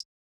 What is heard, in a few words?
Hi-Hat